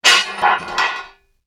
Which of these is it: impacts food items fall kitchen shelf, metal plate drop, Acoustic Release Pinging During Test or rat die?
metal plate drop